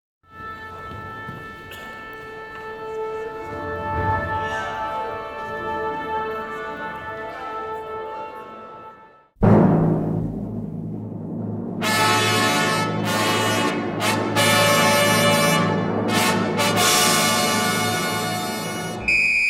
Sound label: Strings Orchestra; Countdown Media